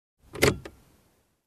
Opening Car Compartment

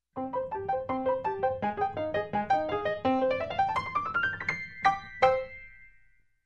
Funny Light Piano Line

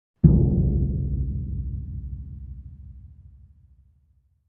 Dull drum hit

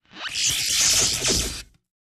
Huge Electrical Shock

